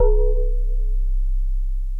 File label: Electric Pianos; Wizoo Electric Pianos